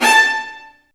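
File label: Marcato, Strings; Vlas Marcato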